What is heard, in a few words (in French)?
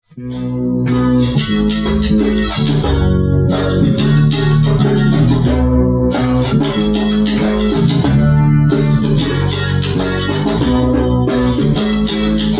California Rythmique Solo